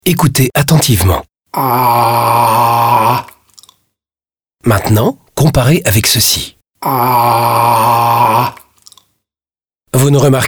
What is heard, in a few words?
Aaaaah; Medicament Generique; FR